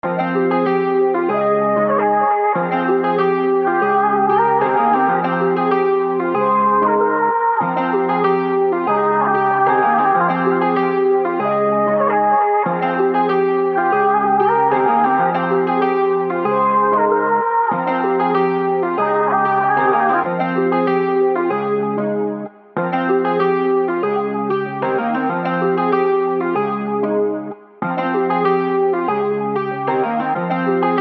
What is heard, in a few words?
Rivers F minor 95 bpm, freeloop